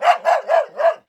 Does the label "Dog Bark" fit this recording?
Yes